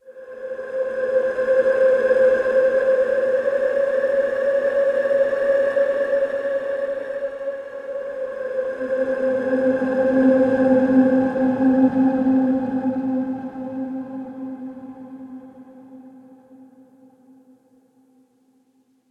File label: Combat Tactical Synth Pad Air Long